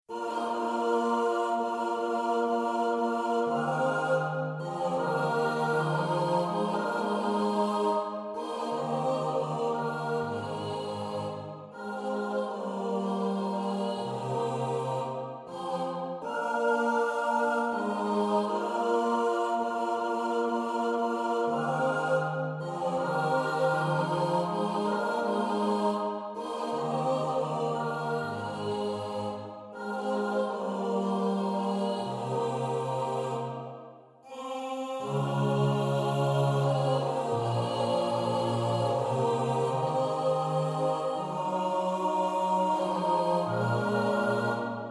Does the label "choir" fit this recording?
Yes